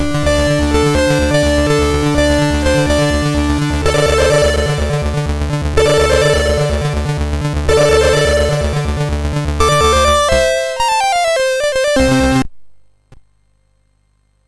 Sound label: sid MOS6581R3, GAMES; Continental Circus